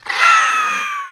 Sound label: Pterrordax Hatchling Attack